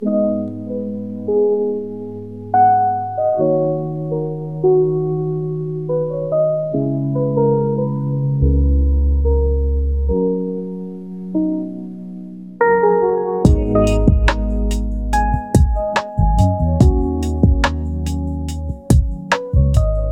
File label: rainy lofi